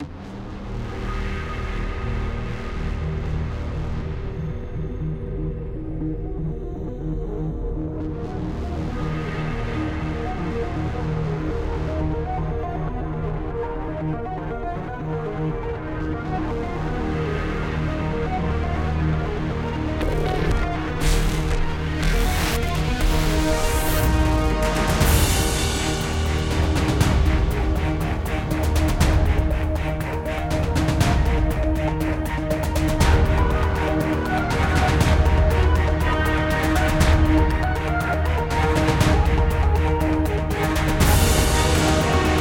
Music Start Vehicle Journey; starforge windows gamerip 2013

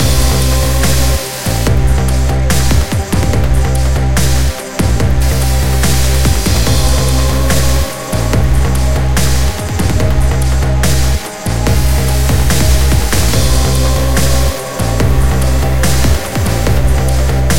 Combat; Pirandello Kruger; mirror's edge gamerip

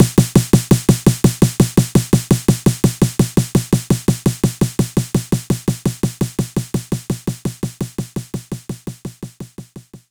TR 909 Snare; knights try

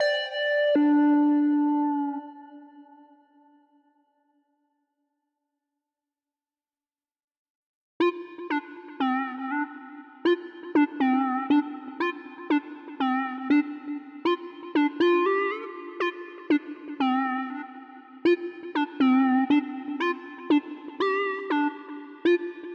Breakout Mellow Mix Stem Layer; Breakout Recharged

